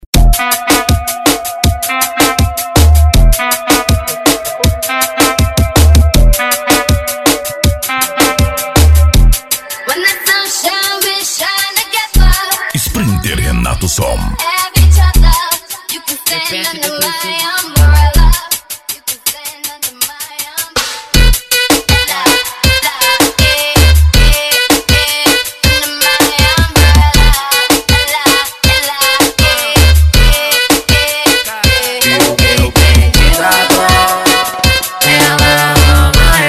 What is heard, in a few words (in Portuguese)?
arrocha funk; sprinter renato som